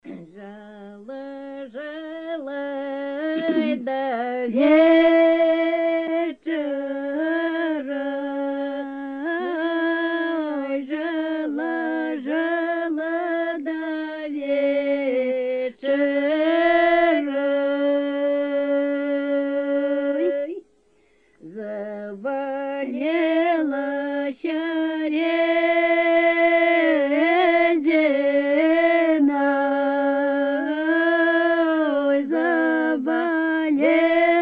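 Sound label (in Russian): Жнивные песни Невельского района; д. Чайки; "Жала, жала(й) да вечэра"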